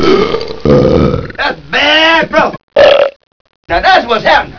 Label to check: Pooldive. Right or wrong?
Wrong